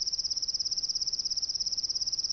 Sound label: insects